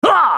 effort; MonkeyKing; Character Voices - English

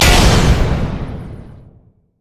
dash; Vi

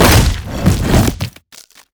zombie emote death; LoL; brand zombie

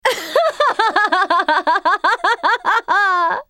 laugh; MissFortune

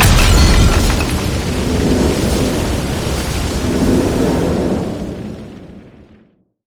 boom; nasus skin05

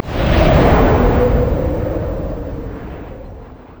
yasuo base quickdraw wind; LoL

